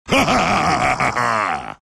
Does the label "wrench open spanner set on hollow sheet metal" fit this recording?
No